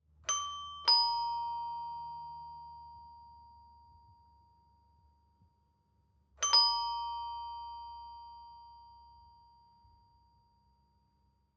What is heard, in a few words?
studio door timbre slow and fast